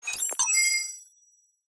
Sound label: USER INTERFACE COMPLEX; PMSFX